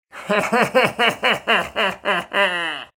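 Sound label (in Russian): звук смеха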